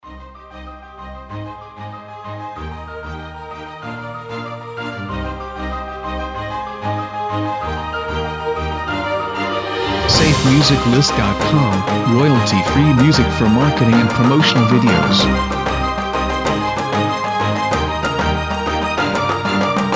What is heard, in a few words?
Epic Hollywood Underscore; SafeMusicList